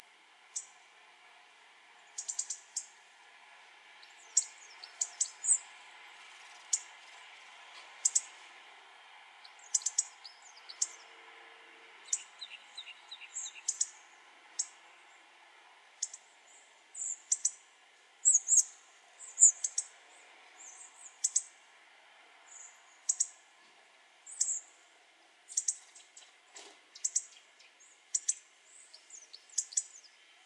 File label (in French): gros bec casse noyaux chant